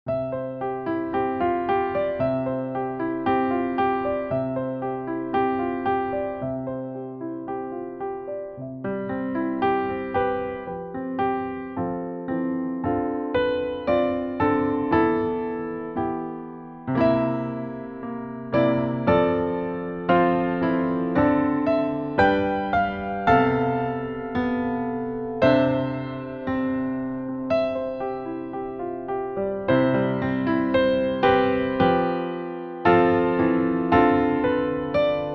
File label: piano; Ecce Homo